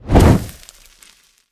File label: flame fall; Explosions; Call of Duty Modern Warfare